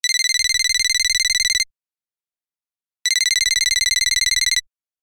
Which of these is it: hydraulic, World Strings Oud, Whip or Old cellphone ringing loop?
Old cellphone ringing loop